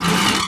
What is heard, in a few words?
metal run, Footsteps; Thalassophobia Restored